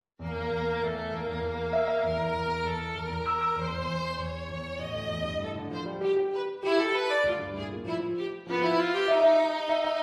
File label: violin Vignette